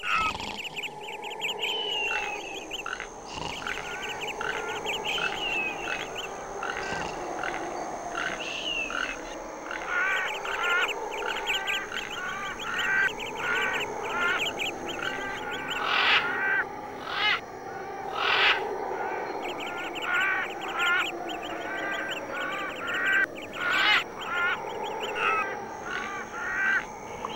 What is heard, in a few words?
MUSIK; MOBICLIC 84; TOUTEDIT